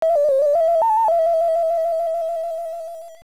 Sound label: Flute; AOL FDS; Whistle; TAoL; Link; River Devil; Nabooru Town; Three-Eye Rock Palace